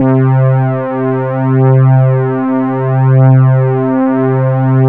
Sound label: poly synth; Sound Gallery - Charged; POLY SYNTH 1